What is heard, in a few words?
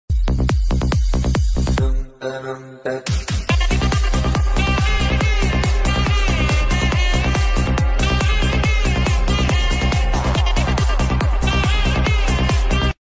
psy trance remix; kantara